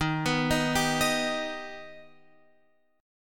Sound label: Eb Augmented Eb, Guitar Open F; Eb+ arpeggiated; x,10,11,10,10,10; JGuitar